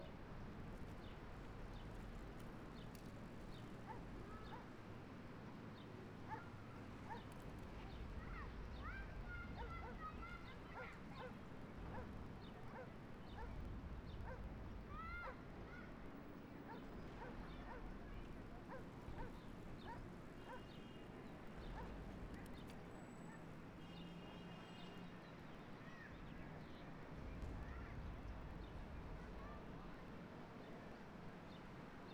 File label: village calm; WAR